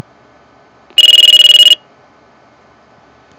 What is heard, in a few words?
low balance ringtone